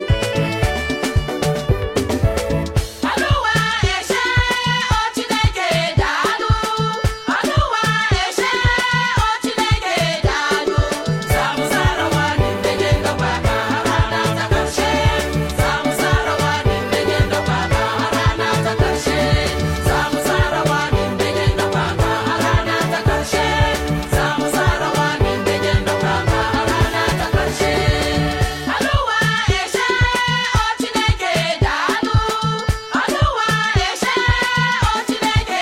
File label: Lovely Chorus